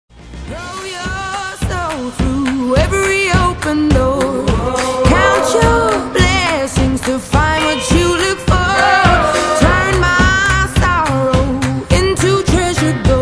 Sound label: drum chorus